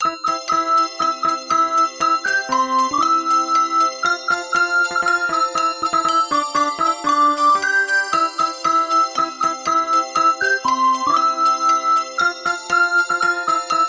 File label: Jingle Bells